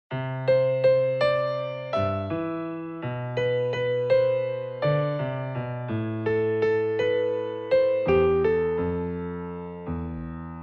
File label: piano